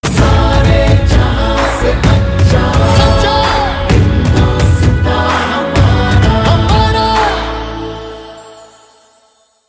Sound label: sare jahan se accha tamil ringtone